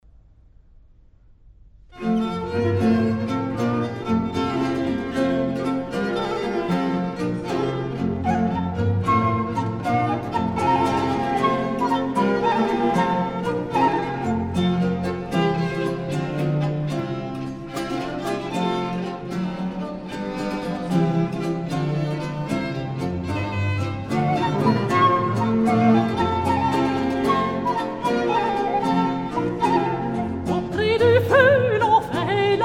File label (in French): Prélude instrumental et chansonette; Charpentier; Auprès du feu l'on fait l'amour; H.446